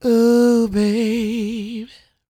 CROON, Vocal Blues & Soul MELLOW

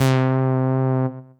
Synth C, Synth Prophet One Shots; Electronisounds

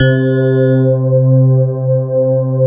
PAD, Drum & Bass; Ueberschall; Drum & Bass Resonance

